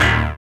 SYN, dance; Sound & Vision - Gigapack II

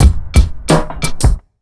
88 BEAT, Pure Electro, BEAT MIX; USB Soundscan vol.03; AKAI

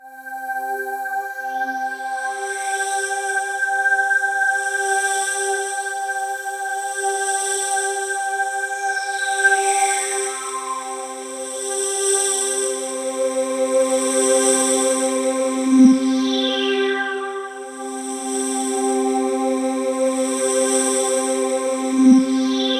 POLY SAW, Roland JV 2080, C4

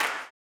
CLAP, Blues Soul; Spectrasonics Vocal Planet

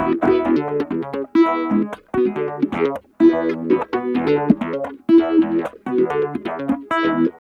Guitar Loop; Ambient Grooves

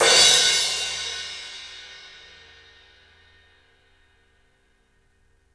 CYMBALS; 3D Sound; 3D Drums

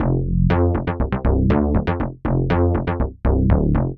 ULTIMOOG; East West; Twisted Textures-BT

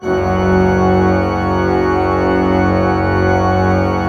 F1, Cathedral Organ; ILIO; Propeller Islands Cathedral Organ

